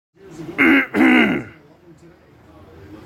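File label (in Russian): запершило в горле когда много народа вокруг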